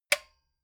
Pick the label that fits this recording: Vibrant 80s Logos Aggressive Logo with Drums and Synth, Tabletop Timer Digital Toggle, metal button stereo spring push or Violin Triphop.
metal button stereo spring push